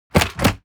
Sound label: object mechanical lever wood small down